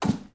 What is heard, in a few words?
Turn Tv Off